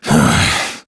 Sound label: Key Grab Exertion; Arthur; Warframe; TC Demo